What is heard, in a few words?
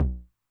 Low Tune Short Decay, 909 LOW TOM; classic machines; LT - LOW TOM